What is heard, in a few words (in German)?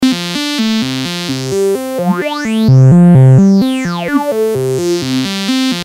CV-Phaser, Alle Parameter voll auf, Modulationsquelle: LFO; modular synth